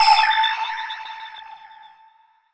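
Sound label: deep house FX, 125 bpm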